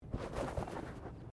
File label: Body movement Foot Foley